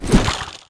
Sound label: hook throw; garrysmod